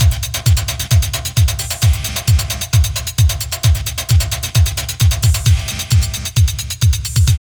LOOP, Tekno Industrial; East West

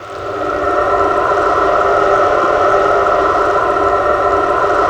PHANT RAIN; Spectrasonic Distorted Reality 1; PHANTOM RAIN

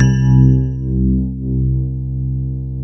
piano C 3; AKAI S-Series CD-ROM Sound Library VOL-8

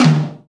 TOM TOM, Real

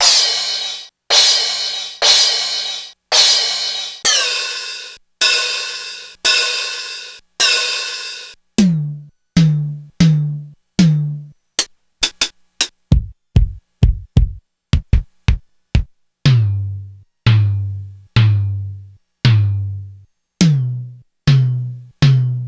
Korg DSS-1

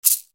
SHAKER, Yamaha RY-30

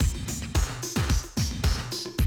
drum loop, 100 BPM

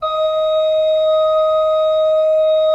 D4, Cathedral Organ, ROHRFLOETE; Propeller Island

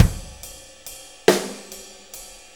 6-8 Loop, Full Kit Loops; Drum Tools

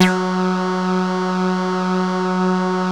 CS-15, BASSES

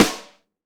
RIMSHOT; EdgeSounds; Drum Mashines; R-8 DRUMS

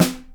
EDGE HIT, ACOUST SNARE; Greytsounds VOL-1

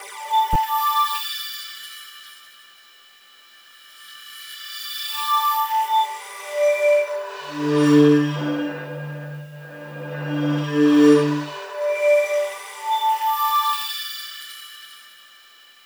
CRYSTAL WAV; Spectrasonic Distorted Reality 1; CRYSTALWAVES

